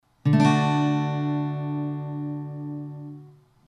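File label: D, Acoustic Guitar chord; Acoustic Guitar Neck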